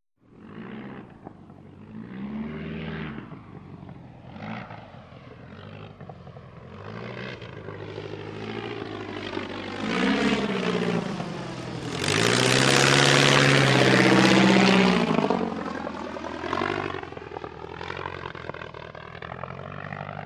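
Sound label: Tiger Moth By High Slow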